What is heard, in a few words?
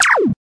interact